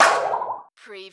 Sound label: Button Sound - Metal Saw Waffle